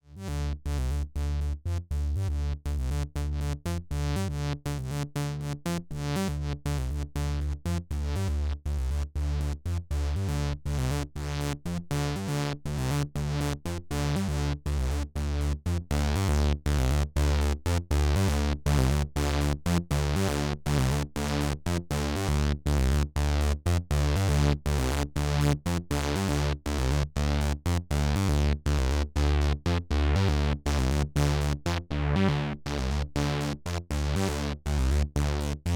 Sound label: dreadbox Erebus V3 Triple Ring OSCs